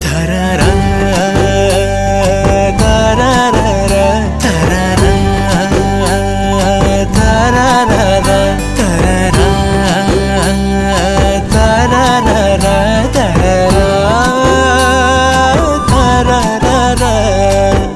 BG Humming; from 10 Endrathulla Movie

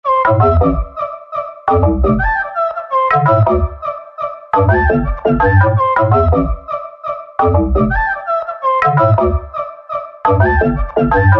BG Tone; from ABCD Movie

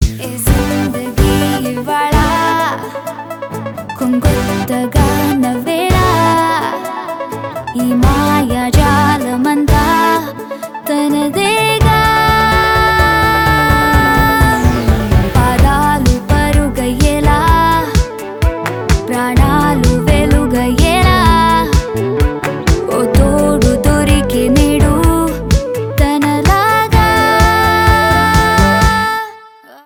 Ringtone; MEB - Most Eligible Bachelor Movie; Telugu Ringtones

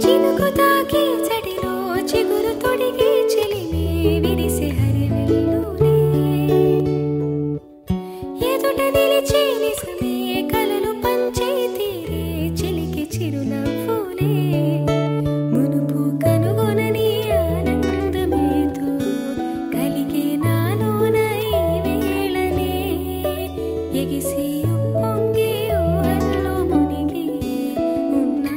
Ringtone; Pelli Choopulu Movie; Telugu Ringtones

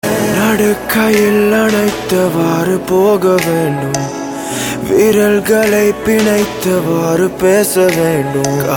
Male Ringtone; Tamil Ringtones; 9 Sec; from Bogan Movie

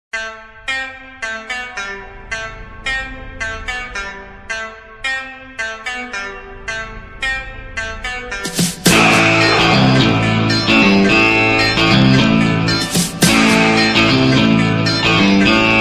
Movie BGM Ringtone; SPIRIT Movie

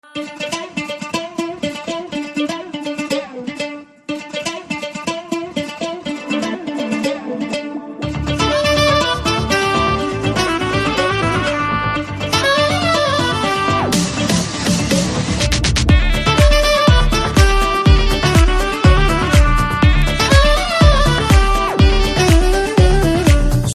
BGM Guitar Ringtone; Telugu; from Sai Dharam Tej Republic Movie

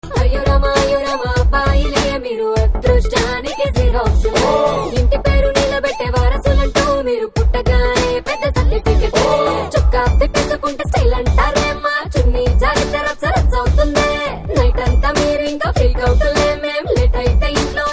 Female Ringtone; 30 Rojullo Preminchadam Ela Movie

